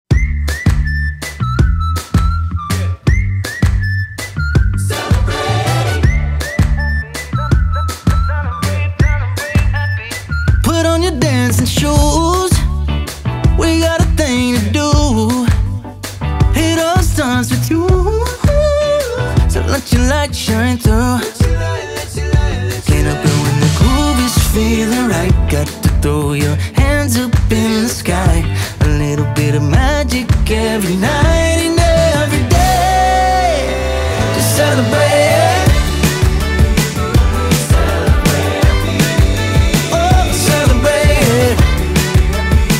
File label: Jazz, Celebrate Happy